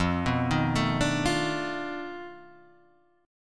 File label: B 7th Sharp 9th Flat 5th, guitar